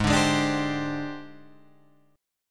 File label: G sharp Major 7th Flat 5th